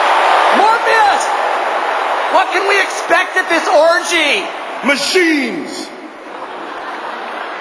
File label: machines